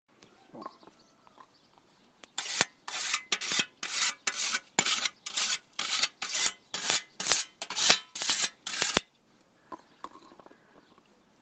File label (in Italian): molare la falce